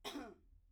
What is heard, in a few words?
cough; IEEE AASP